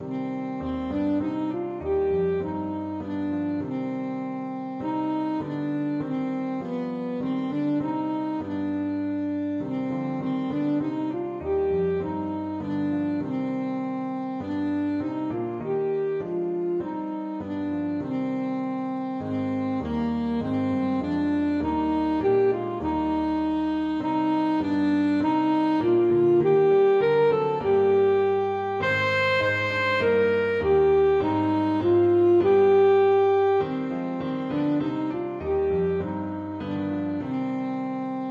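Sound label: alto saxophone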